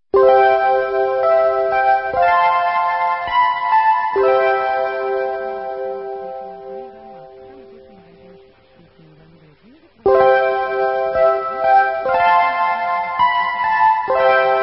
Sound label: interval signal; ur2; позивні 2001 р.; Радіо “Промінь”